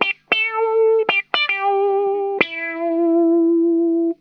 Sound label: PLEEEZ, Funk Guitar; 90 s Sample CDs; Zero G